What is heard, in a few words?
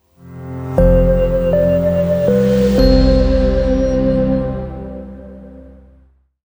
ABrightViewBumper